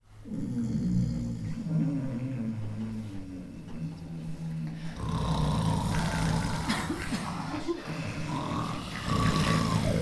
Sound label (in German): schnarchen; ZOOM